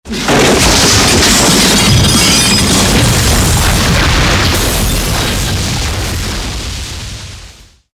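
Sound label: explosive destruction sequence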